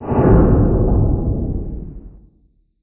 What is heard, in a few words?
freight brakes distant